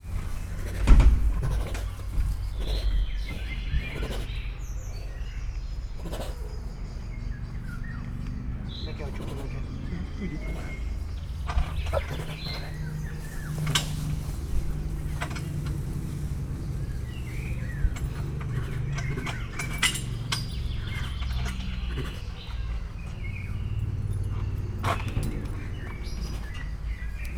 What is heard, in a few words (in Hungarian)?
esti szállására tart, szibériai tigris; szegedi zoo 2011